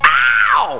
owww

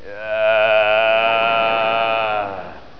moan

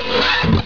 screech

